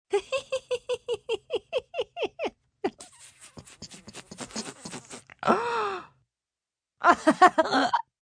Laugh Hee Hee, Laugh, female, hee hee laugh, through teeth, gasp (human voice); Laughter Sound Effects; Sound Effects:Voice; VoiceBox